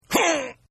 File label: Grunt; Grunts; Voice; Partners In Rhyme